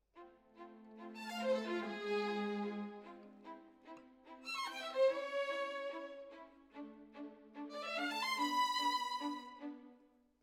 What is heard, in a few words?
Violin Solo